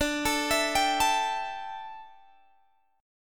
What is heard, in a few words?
D Suspended 2nd Suspended 4th D down Guitar Open G